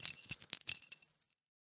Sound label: Keys Shake; ModeAudio Found Percussion Hits